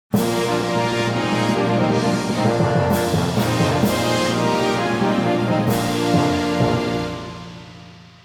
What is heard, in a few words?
Jingle-Floor Clear; The Nightmare of Druaga; Dungeon of Mystery